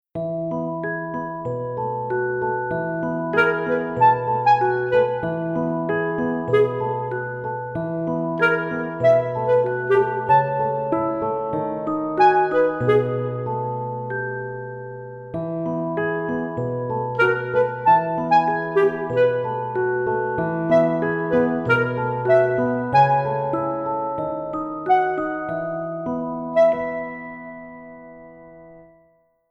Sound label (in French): Magic Clarinette; Féerique